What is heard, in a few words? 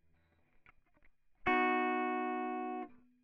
plucking; logic